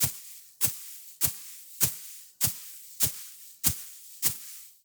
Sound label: Buckwheat Hulls, grains, course, sack, pounded, meaty; Vaeyan II